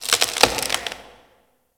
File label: Hub Tree Creak; Ambience; Warframe; Tenno Hub